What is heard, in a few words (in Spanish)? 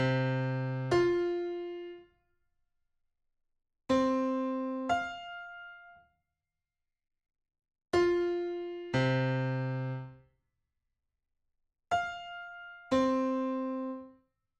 Intervalo oncena justa